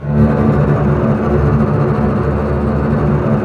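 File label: double bass tremolo; String Master Series; Cbs FX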